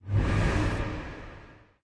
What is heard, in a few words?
body swish; smite; thanatos